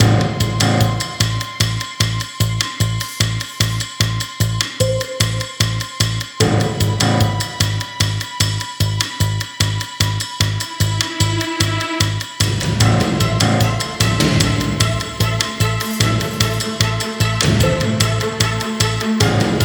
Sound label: Dark Dungeon A C T I O N Loop; Eternal Edge